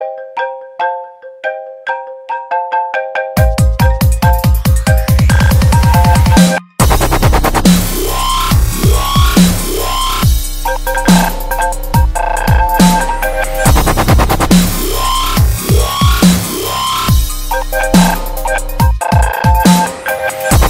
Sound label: new iphone dub, ringetone